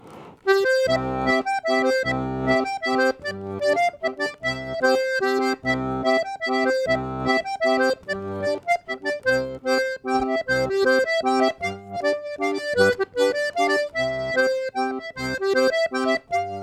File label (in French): mazurka